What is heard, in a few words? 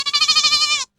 Goat Bleat; Meme edits